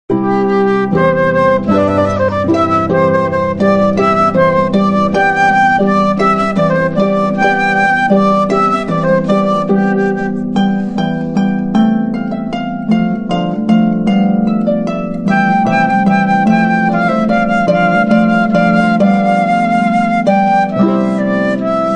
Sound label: Hornpipe